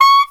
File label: STAC C#5, Clarinet Stacato; DirectWave; Varazdin Orchestral